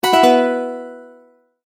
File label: multimedia tone end; Tones; Sound Design; McKinney Sound